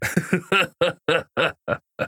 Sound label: laugh; Devour